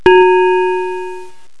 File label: BELL NO12